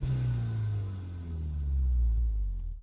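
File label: engine high rev down; Blind Drive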